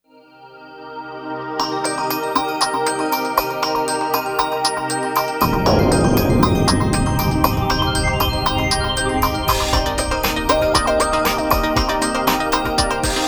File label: Instrumental; Tears to Tiara(GAME Ver.)